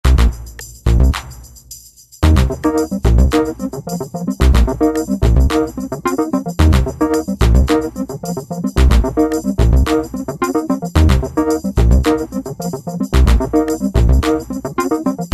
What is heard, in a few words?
Beat